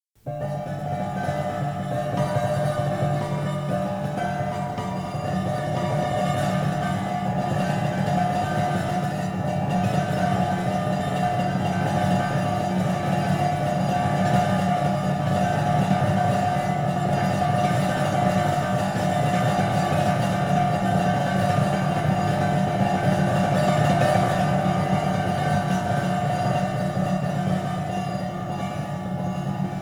morning chimes

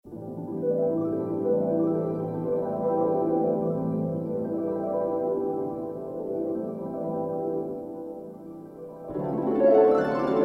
Jingles for films podcasts

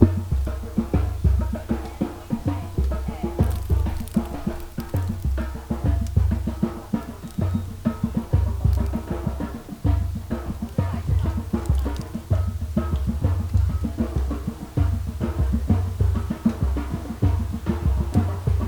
Drum Circle Gokarna; Environments; Samuel Corwin; Selected Field Recordings from India and Nepal Volume III

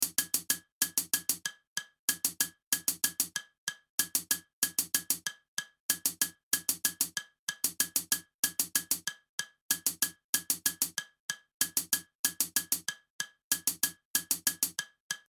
hi hat with a rim shot; Loop Mania Sampler Pack

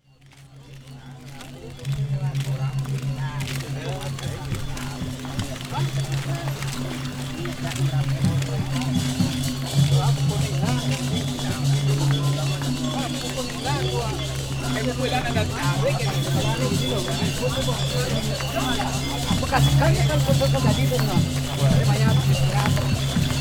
Balinese Marching Band; INDONESIA; Les Cartes Postales Sonores; NOISE ON EARTH Vol5

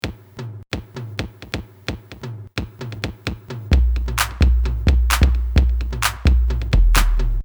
130 support tribal style; Loop Mania Sampler Pack

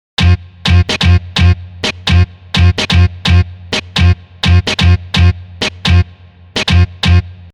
127 reaggae cut; Loop Mania Sampler Pack

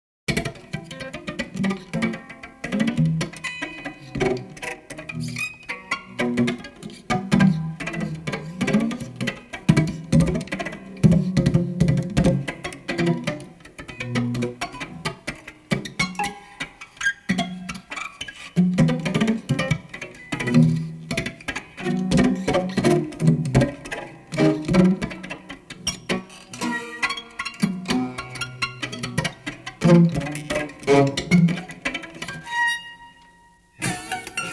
19 String Violin

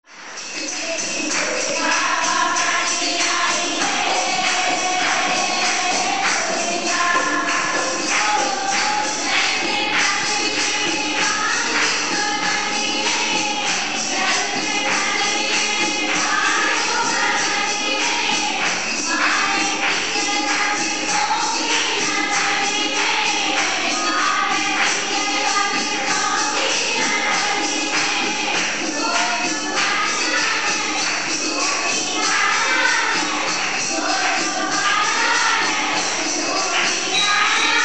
Women Singing In A Temple In Ram Jhula Rishikesh; Field Recordings From India Nepal

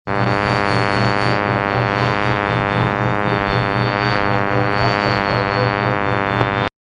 raw sounds

